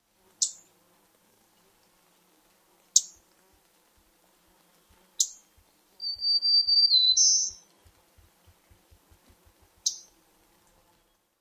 single-chips amp song; NY

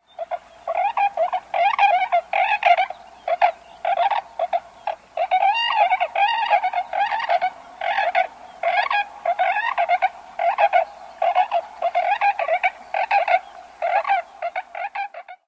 courtship duetting

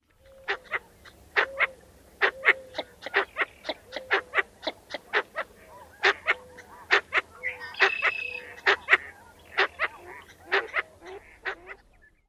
female and male calls

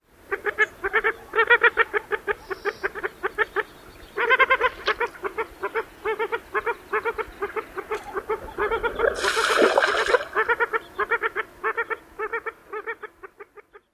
female cackling

